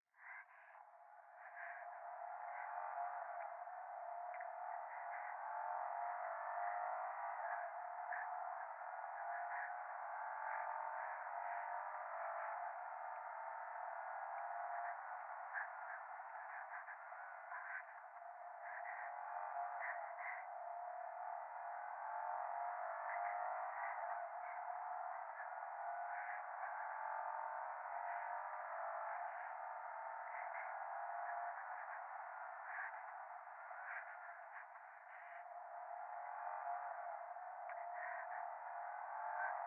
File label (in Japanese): 右耳快感吐息 車の中